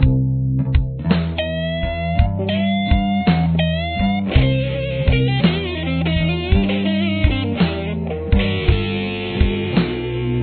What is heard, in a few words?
lick, guitar